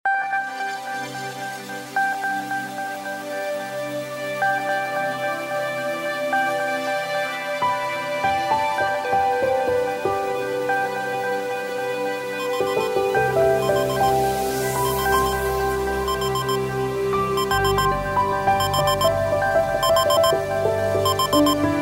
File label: Morning Bell; KF755 Secret